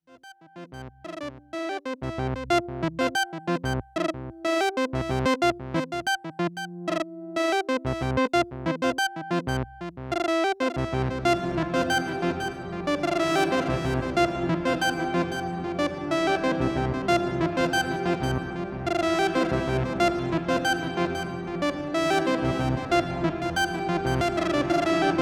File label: Synthesizer Reverb Hall Mercury 7